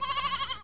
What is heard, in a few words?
babies menu command; Desktop Themes 2000; Tiere